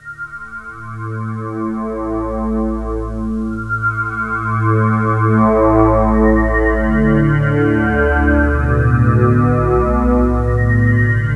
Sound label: Suspended Pad; Sonic Foundry (Sony Creative Software); Loop Noir